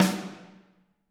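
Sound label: snare drum, Drums; Bob Clearmountain Drums I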